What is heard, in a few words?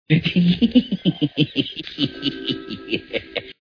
Joker Laugh